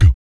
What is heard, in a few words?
VOC PERC, BASS PERC; ILIO; Vocal Planet VOL-3; Jazz & FX